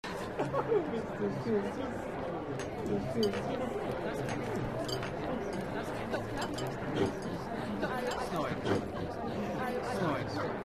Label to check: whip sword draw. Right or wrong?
Wrong